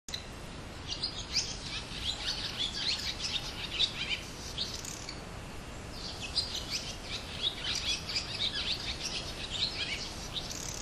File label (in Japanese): tsubame